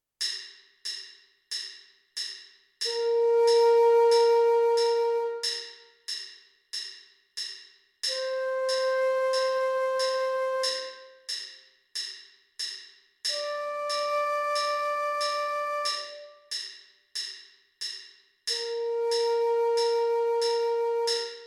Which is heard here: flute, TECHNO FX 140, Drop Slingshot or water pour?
flute